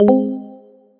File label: notif